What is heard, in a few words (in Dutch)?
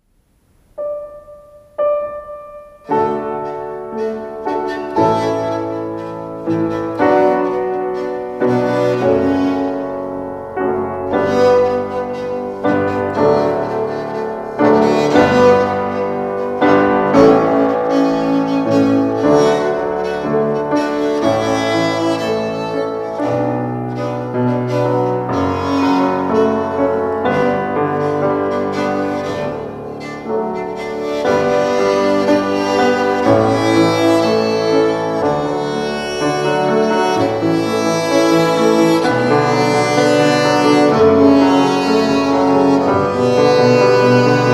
pachelbel piano viool begeleiding